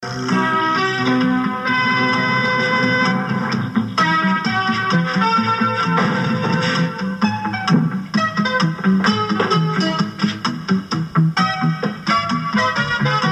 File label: funny theme